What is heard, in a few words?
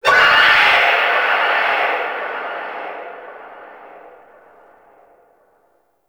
Stadium Infested Medium